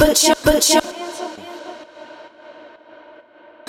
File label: put your hands up, 2 bars, 130bpm